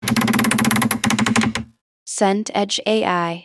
wardrobe door creak

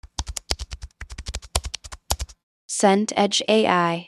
keyboard laptop soft

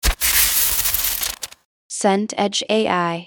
paper turn

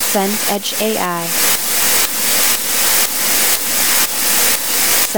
noise grey bed

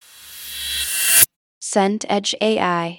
swell reverse

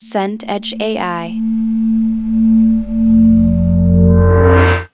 portal open

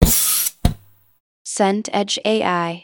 gas hob ignite

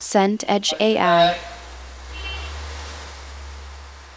crowd walla street

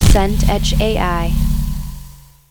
explosion close

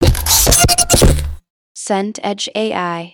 chair sit leather

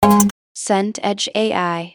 guitar pluck nylon

